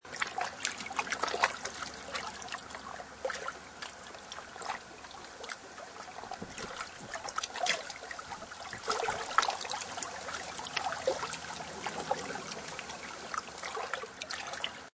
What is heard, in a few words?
Water Lapping Long, Close recording of very small waves lapping up against stony be; Seamlessly looping sound, long version.; Lake